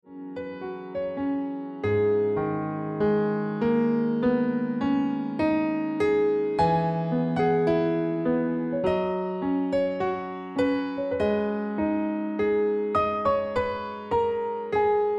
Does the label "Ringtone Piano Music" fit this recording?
Yes